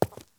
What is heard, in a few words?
Cement Foot Step; Welcome to the Game II